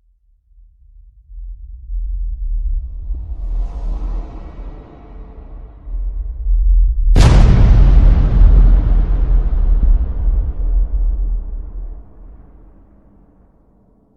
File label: bigbang, heavy weapons and explosions sound effects